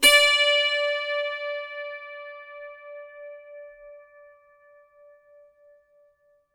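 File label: D5, dulcimer pluck; SoundBytes; Early Patches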